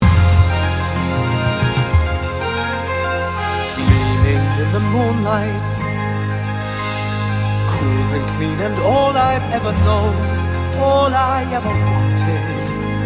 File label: Adult Male Cast Recording